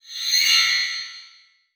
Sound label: Multimedia Internet CD-Rom Flash Hi-Tech